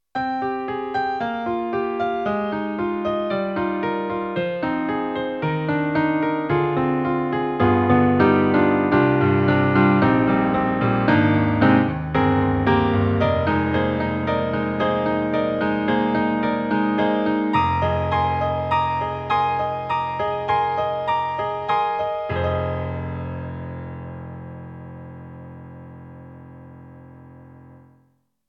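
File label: Easy Rocker, piano; Roland; SRX-07; XV-5050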